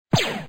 Gun; Gun Jiguangbu; Blockman Go; Android

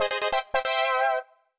Vs Fanfare

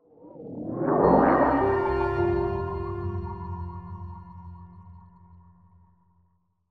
Checkpoint Teleport

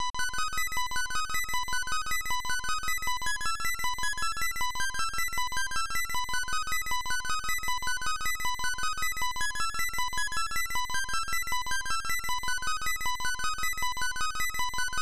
Stage 4 Victory; gekisou sentai car rangers 1996 snes

